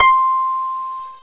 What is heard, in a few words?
harp C5; Alone in the Dark Demo; Adventure